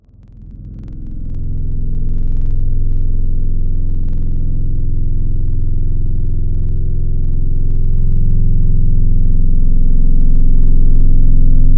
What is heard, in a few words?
Violin; Synthetic's Serum Collection; Echo Sound Works Core Tables; ESW Real